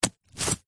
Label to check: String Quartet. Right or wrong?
Wrong